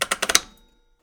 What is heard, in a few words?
Submarine Knob